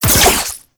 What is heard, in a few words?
Flechette Whoosh; Warframe; Tenno; Oraxia